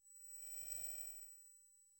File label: peaceful blink of light